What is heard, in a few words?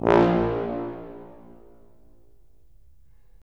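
brass tuba; LA Composer vol. 3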